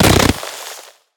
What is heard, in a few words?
Bullet Impact Dirt; Blastwave FX